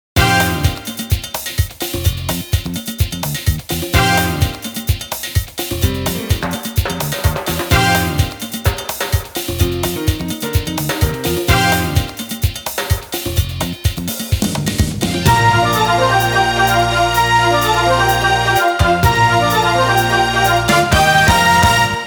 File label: Samba; Taiko no Tatsujin 8 2006; PS2